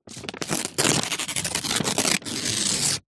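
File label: household instructions pamphlet glossy paper rip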